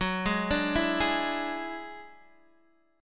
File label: Gb 7th Suspended 2nd Sharp 5th, Guitar, Open D